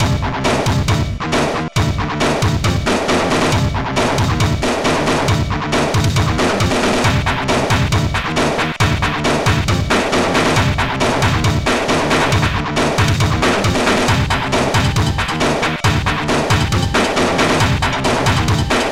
drums; Hottest 6 (1996)(PDSoft)

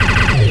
fan fire; thexder

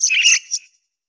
bird budgie; Earth and Beyond; EA Games

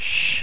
shh; PC World Komputer 1998 January; Matmath

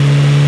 out car; Driver

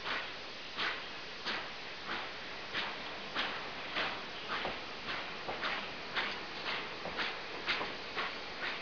train; 12000 Graphics Pack - A Complete Graphics Collection For Your PC

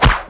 throw; pong

